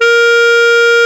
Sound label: Bb ff Clar D3